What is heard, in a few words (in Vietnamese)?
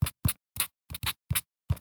Tieng dung but danh dau khoanh tron